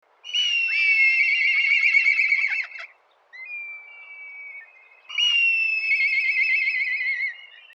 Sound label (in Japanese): tobi